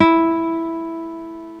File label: guitar; MATLAB